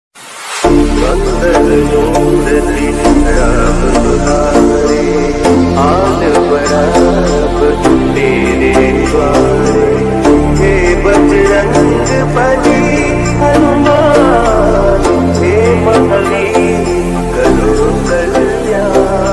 mangal murti ram dulare ringtone hanuman chalisa ringtone